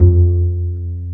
Cb Pizzicato; STR; Roland L-CD702; Cb Pizz 1

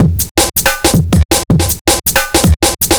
Beat, Drum N Bass; Beats; Sonic Foundry (Sony Creative Software); Knutrix Drum & Bass

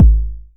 909 BD; Goldbaby Tape808 & Tape909; The Tape 909

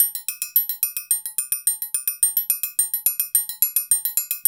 Udu Calypso

